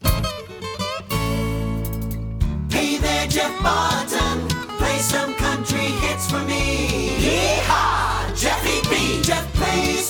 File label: Jinglebells